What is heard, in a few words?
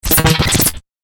Mech Droid Chatter, robot sound effect; science fiction sound effect; Andrew Fleming; Twistedtracks